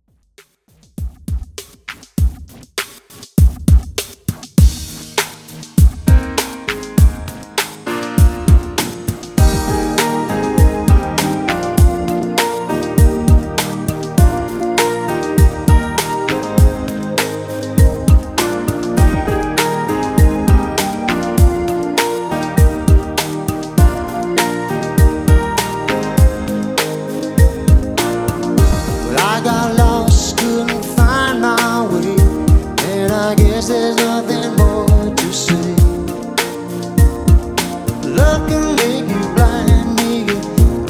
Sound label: Classic Redrum 100Bpm